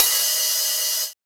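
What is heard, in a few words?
POWER open hi-hat; Drums In Your FACE!; BONUS HATS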